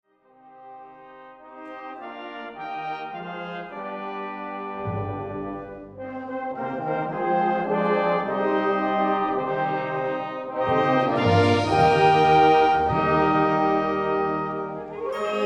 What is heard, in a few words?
Polish Christmas Music, Symphonic Band; Palos Verdes Symphonic Band; From PVSB's Holiday concert, Dec. 12, 2010